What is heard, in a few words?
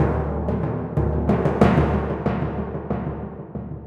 Timp d a sharp; Torchlight III; PS4